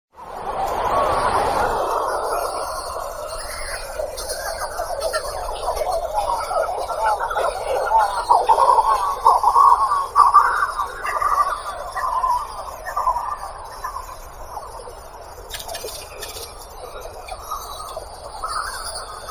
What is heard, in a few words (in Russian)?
burevestnik bullera puffinus bulleri